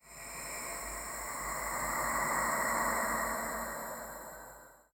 sand monster; mobs mc

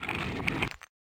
chests shulker open; Minetest; VoxeLibre

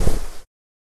sounds cloth; Minetest; VoxeLibre

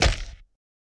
criticals hit; Minetest; VoxeLibre

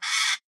parrot death; Minetest; VoxeLibre; mobs mc

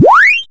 cast; Flopsy; monsters

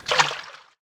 potions bottle pour; Minetest; VoxeLibre

